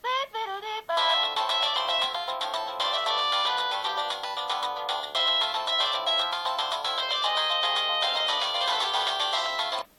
samsung sghe700 ringtone

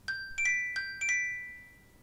ringtone; illuminate; apple iphone 5

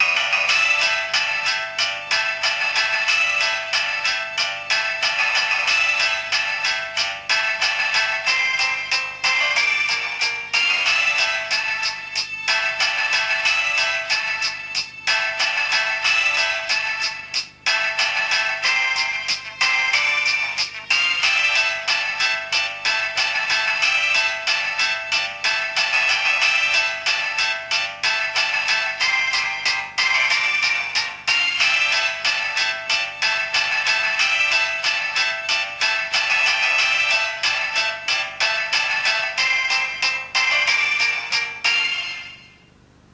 nokia 1200 bold ringtone

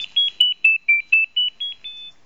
nokia3510i etude ringtone

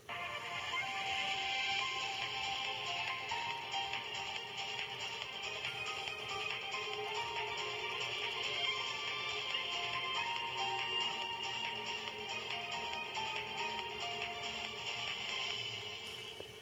nokia 2610 ringtone; euphoria

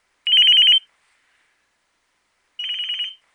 nokia3100 ringtone

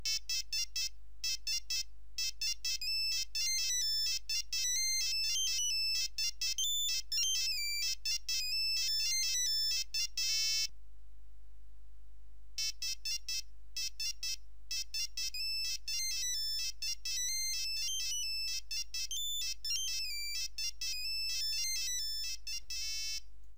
nokia 3310 hurdy gurdy ringtone

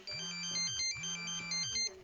nokia 5510 ringtones samba rumble

